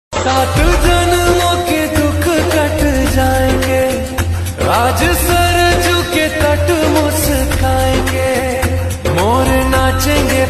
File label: Bhakti ringtone